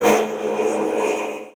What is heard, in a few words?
Transition Whoosh Medium; Production Element; Title Transition; AdobeCC